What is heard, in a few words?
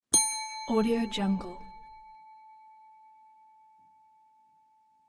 desk bell